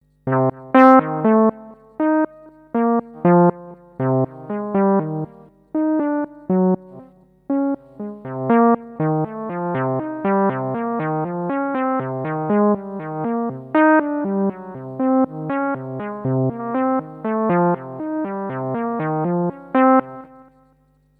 vector melody

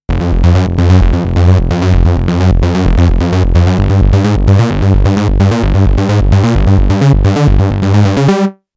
unison bass

